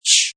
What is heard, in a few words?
Hover, Button sound; Multimedia